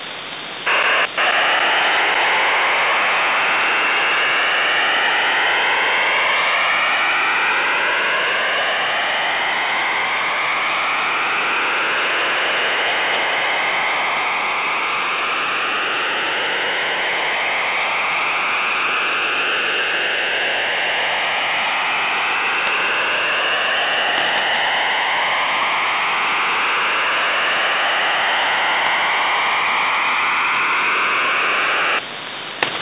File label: mix-mode psk-2 vs psk-4